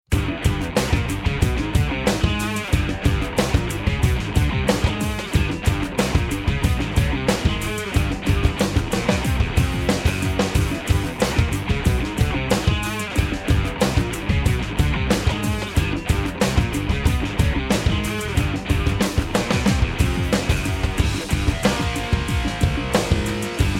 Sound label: Rock Walk Instrumental; PM USA